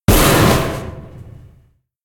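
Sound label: gate spawn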